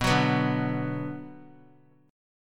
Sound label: B-Minor; strummed; JGuitar